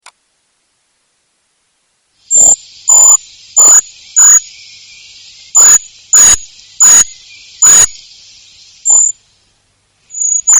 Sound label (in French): grimpereau des bois, chant